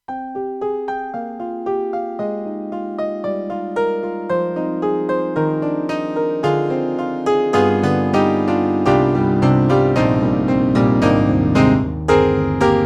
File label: Piano; Roland; JV-2080; GM; Piano 3